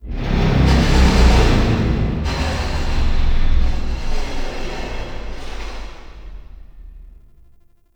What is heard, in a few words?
Vault Heart Beat Broken Creak; Ambience; Gameplay; Warframe; Infested Eris